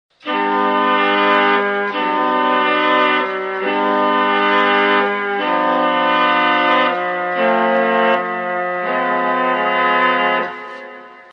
fair saxy

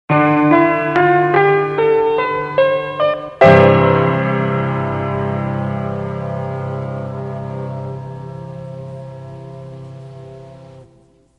piano

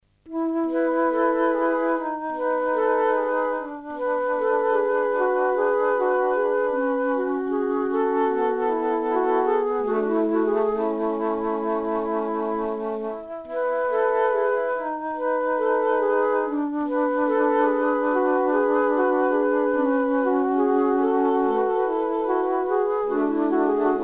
flute

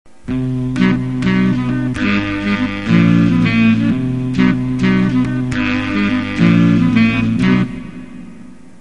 alto sax

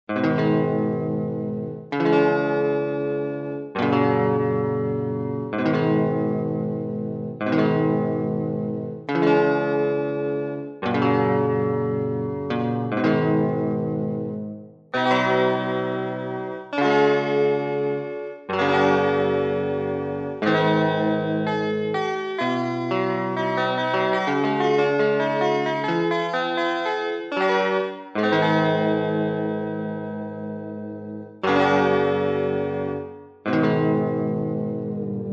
electric guitar

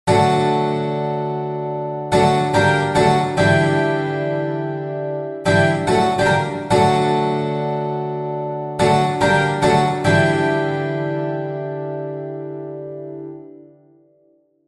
12 string

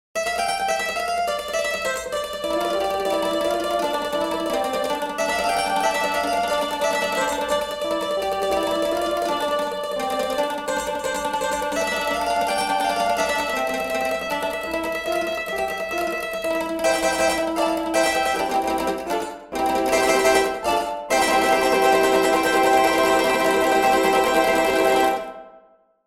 mandolin

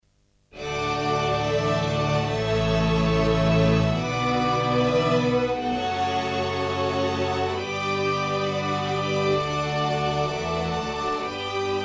electric string section

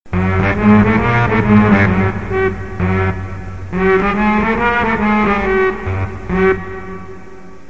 trombone

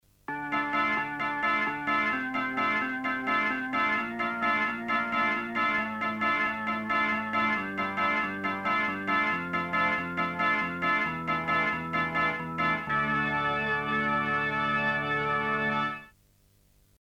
hammond c3 dirty

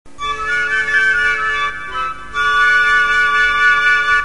piccolo

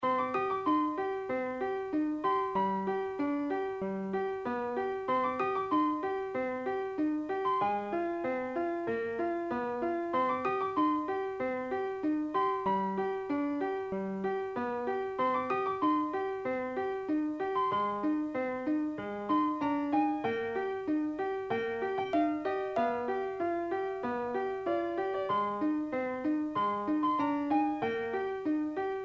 mk I piano upper octaves

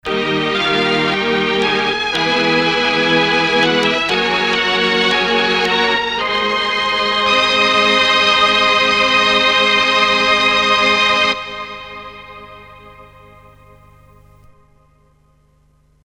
violins

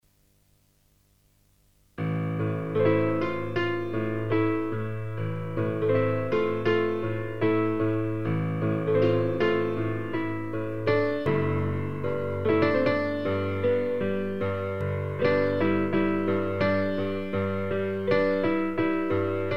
piano

